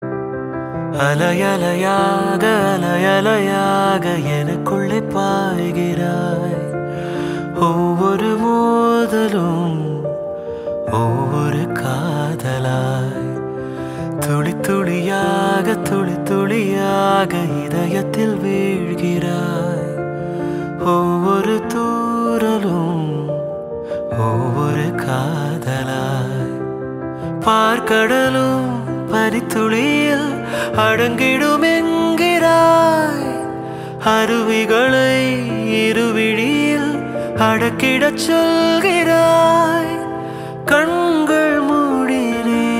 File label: Ringtone; Suriya